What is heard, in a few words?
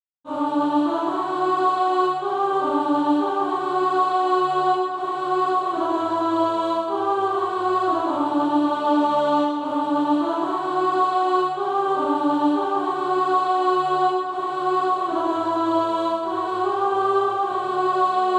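alto; Christ Receiveth Sinful Men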